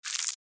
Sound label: Cockroach being squashed